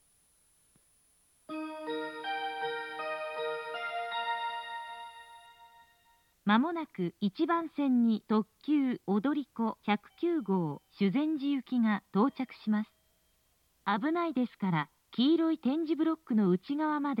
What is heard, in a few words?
limited express; jr central; mishima; odoriko109; shuzenji